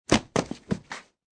Body Fall Impact; Wrestling and Struggling; Combat

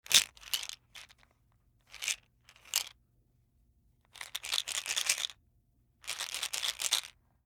pill bottle shake, Pill Bottle, Shake; Shaking Plastic Pill Bottle; Bathroom; Household

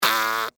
Bee, Buzz, Wings, Short, Insect, Bug; Bees; Animals:Insects; Blastwave

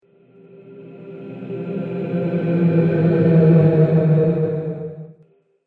Ghost Of The Mist, ghost sound effect; horror; Andrew Fleming

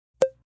Knock; Knocks

